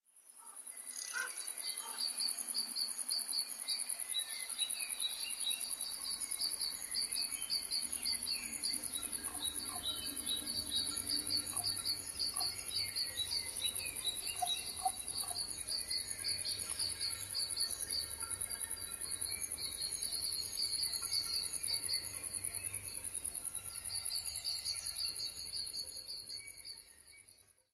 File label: Birds Groove